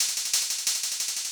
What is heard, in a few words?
LOOP, Drum & Bass, 180 BPM; Ueberschall; Drum & Bass Resonance